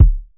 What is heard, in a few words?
Kicks